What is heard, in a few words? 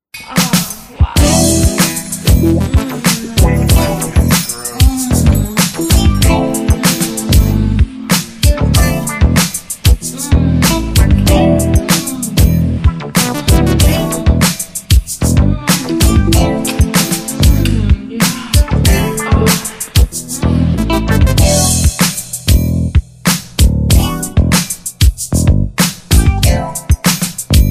groove